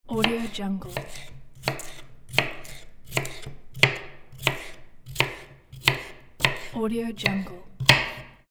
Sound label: cutting cucumber